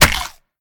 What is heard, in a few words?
hit; Smite; Tiamat